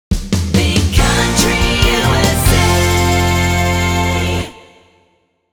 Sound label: COUNTRY FEEL GOOD SHOTGUN